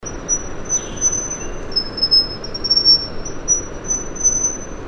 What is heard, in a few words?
spotted wren-babbler spelaeornis formosus